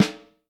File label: Vintage Snare Drum, 6.5X14